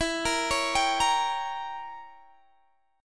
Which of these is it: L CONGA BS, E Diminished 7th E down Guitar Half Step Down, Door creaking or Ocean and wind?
E Diminished 7th E down Guitar Half Step Down